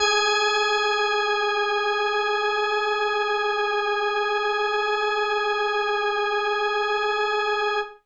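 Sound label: Indie Organ Kawaii G#1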